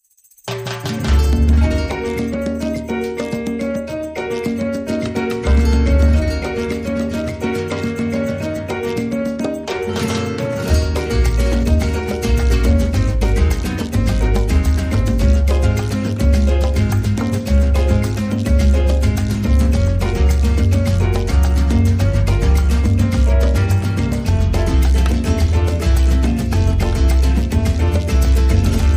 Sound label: Salsa, Rhythm Section Only